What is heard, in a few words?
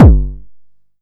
Bass Drum, Jomox Xbase-09; Drums